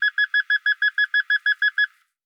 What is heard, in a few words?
auto subaru collision warning alarm